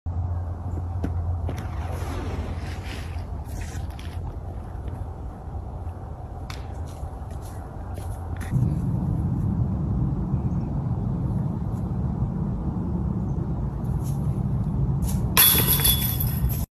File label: Flying Disc